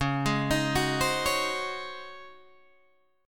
Db Major 7th Suspended 2nd Suspended 4th, Db, Guitar, Open G